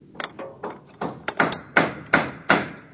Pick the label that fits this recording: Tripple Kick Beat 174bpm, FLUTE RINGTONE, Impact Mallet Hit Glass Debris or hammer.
hammer